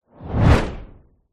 sound design shockwave whoosh into metal slam

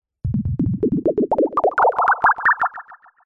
synth rocket clock robot stepped power up; Jessey Drake

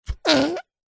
leisure whoopee cushion short squeeze fart

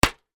industrial step ladder metal step hit foot hard; zapsplat

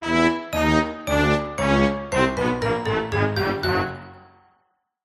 cartoon musical descend

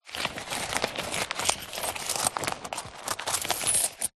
purse pouch leather hand rummage coins notes; zapsplat; foley

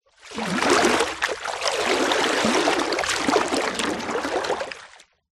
nature water deep movement surface

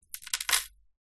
household safety pins handful set down table

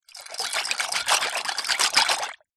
food pasta dried in pan with water movements hand

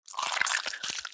Gore Splat Splatter; Just Gore 2; soundbits

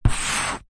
office chalk draw line on chalkboard

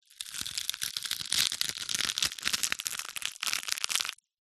egg shell crush in hand; zapsplat

